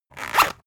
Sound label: zipper close harsh